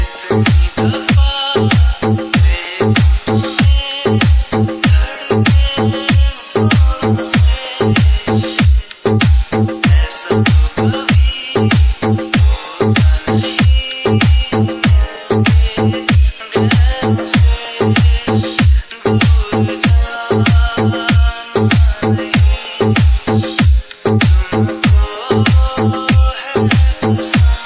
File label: trending bollywood ringtone